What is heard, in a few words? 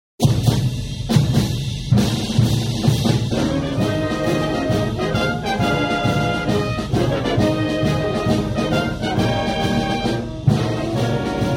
drumroll